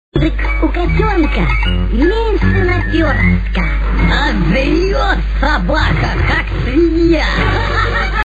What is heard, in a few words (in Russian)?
kotenok